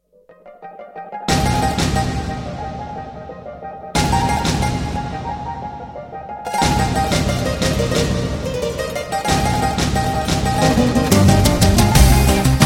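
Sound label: Russian music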